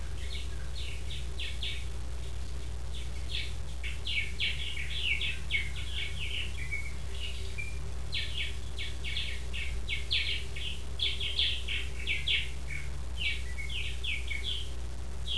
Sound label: Singing